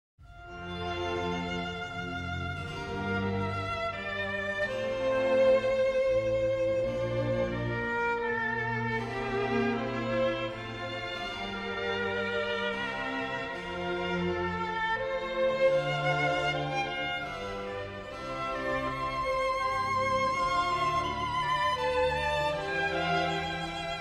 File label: Concerto For Two Violins in D Minor, Largo Ma Non Tanto; Bach, JS; BWV 1043